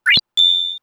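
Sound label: special flower; SNES; SMRPG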